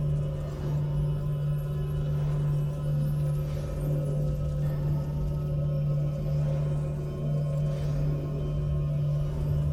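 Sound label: float loop; smite; olorun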